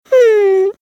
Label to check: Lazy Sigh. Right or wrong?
Right